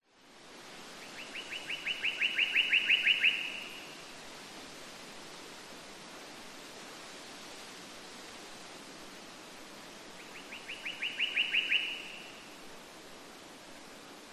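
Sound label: nature forest ambience unidentified bird call short winter qld australia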